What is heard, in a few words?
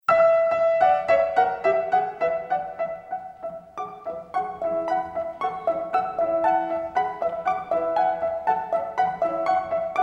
Musicbox